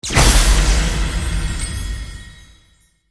spell archer observer

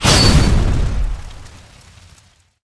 spell final attack; fighter

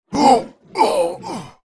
dead, orc knight; monster

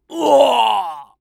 man

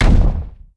walk heavy

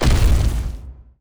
foot large

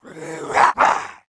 attack, ice snow man; monster

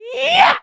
small attack

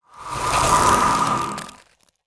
spell ice bolt cast; magician

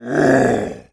big attack, voice

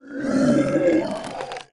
gorilla

